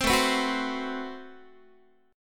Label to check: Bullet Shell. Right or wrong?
Wrong